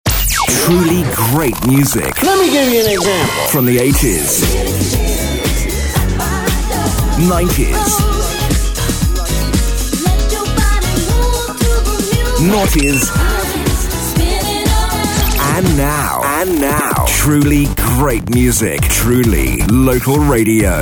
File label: Music; BRFM